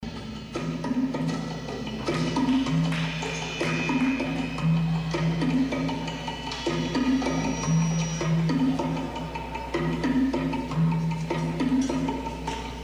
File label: Drums; Festival 8